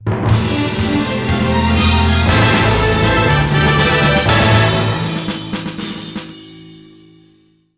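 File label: stage clear; metal slug 6; atomiswave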